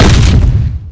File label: explosion hit